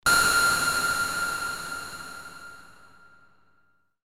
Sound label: sonar